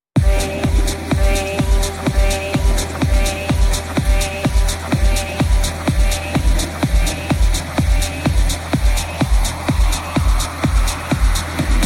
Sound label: Ext Dance Mix Vocal House